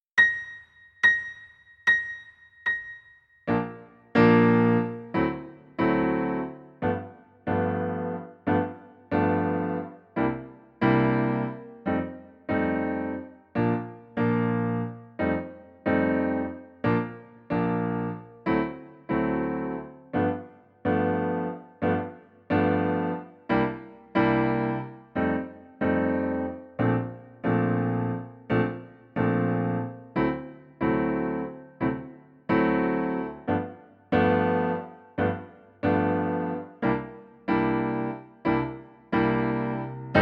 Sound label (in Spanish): 72 BPM sin flauta